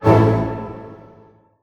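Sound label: A major pianissimo, orchestra hits; Sound On Sound - Peter Siedleczek's Orchestra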